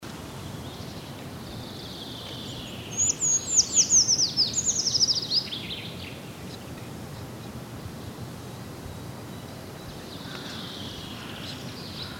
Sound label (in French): Grimpereau des bois Certhia familiaris